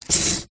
polystyrene strip long thin slide behind objects

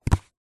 household plastic cup empty grab pick up; zapsplat

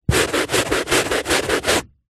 household brush scrub carpet; zapsplat

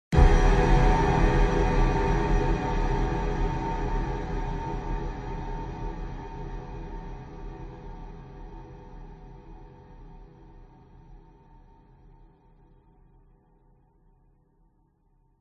horror hit stab piano airy boom

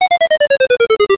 arcade game fall tone

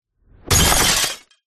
impact crash mostly glass hard

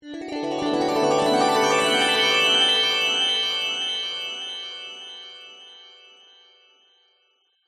sound design magical rising twinkling nostalgic

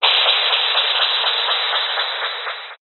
science fiction radio signal interference burst

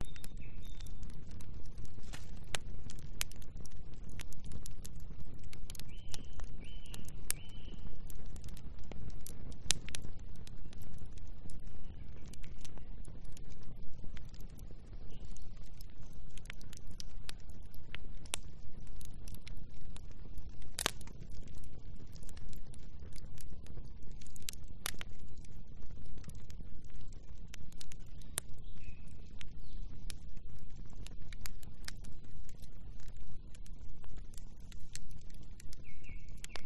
Campfire; west wolf

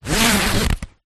foley rain jacket zipper; zapsplat

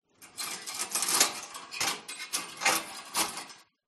Fireplace Curtain

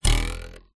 cartoon bounce jump ruler twang

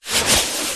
foley garment bag fabric suit movement across bag

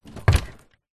foley luggage suitcase set down in cupboard

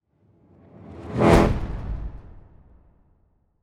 Swell To Impact Musical; bottlerocket

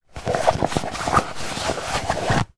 foley hand movement inside thick rubber bag

